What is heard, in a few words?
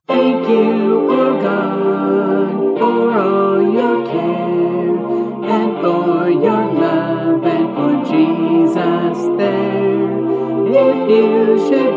Lullaby vocal